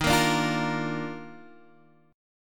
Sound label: Eb Major 7th Suspended 2nd; EbM7sus2; strummed; JGuitar